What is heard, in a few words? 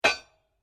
SLEDGEHAMMER METAL IMPACT MEDIUM; Working with a sledgehammer; Hammer Sound Effects; Tools